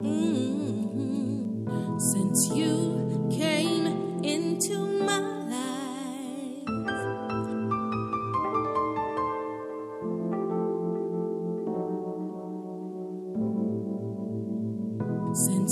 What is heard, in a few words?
Chillout Funk Fusion